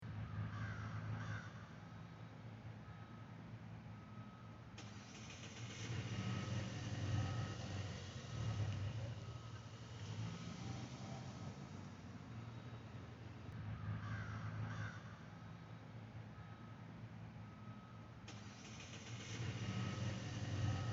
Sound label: soundscape outdoor recording; broughton